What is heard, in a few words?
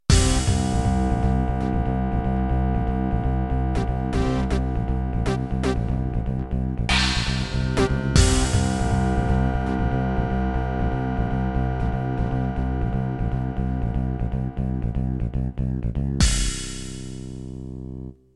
Progressive End